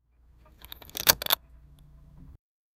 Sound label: soft cinematic, time capsule